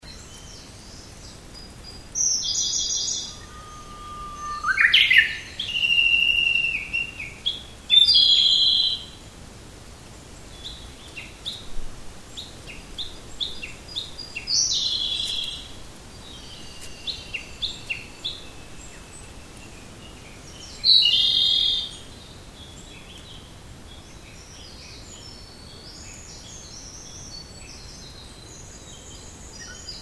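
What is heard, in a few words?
komadori, Japanese robin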